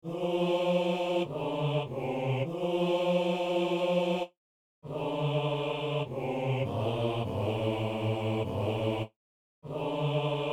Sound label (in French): dieu est amour, trois voix, hommes; Saint-Denis